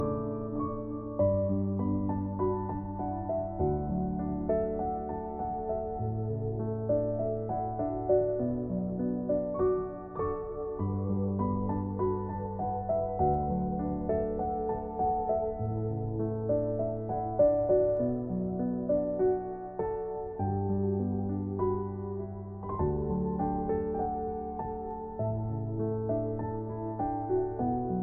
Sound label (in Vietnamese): piano không lời